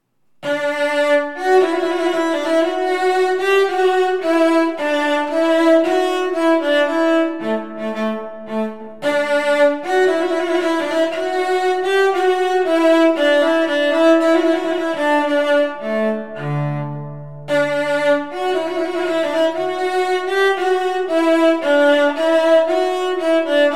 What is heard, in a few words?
Trumpet Voluntary; Clarke